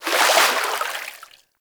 Hoverboard Terrain Caster Fluid; Warframe; Vehicles; Venus

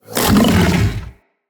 Vocal Attack Short, Hunting Critters; Characters; Predasite

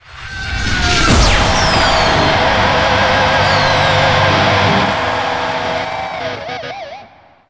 Duet Entrance Stinger; Warframe; Nineteeen Ninety Nine; Lich Duet; Inf Lich

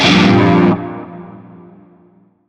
Shawzin Blues Chord; Warframe; Narmer Shawzin

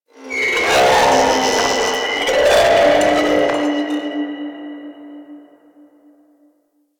Speaker Stack Screech; Ambience; Props; Warframe; Nineteen Ninety Nine

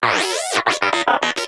Inquisitive Vocal Greeting; Pets; Warframe; Moa

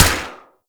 Fire; Warframe; Weapons; Single Viper; Wraith

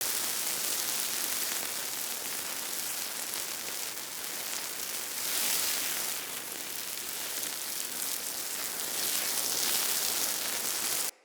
Laser Rune Impact Loop; Runes

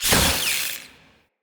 Galvanic Zapper Arc

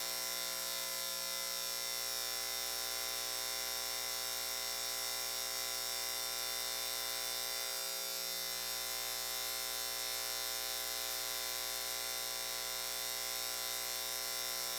Street Light Loop; Ambience; Props; Warframe; Nineteen Ninety Nine

